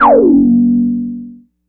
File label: Moog one shot, Synths; Sonic Foundry (Sony Creative Software); Electronic Point Blank